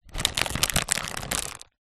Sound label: crisps potato chips packet box squeeze pressure; food